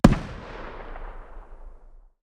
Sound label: hmg dshk medium distance, 12.7x99mm; tacz-tweak-pack; taczmechanics